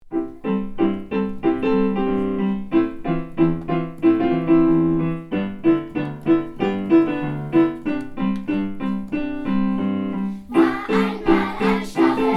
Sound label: voc kids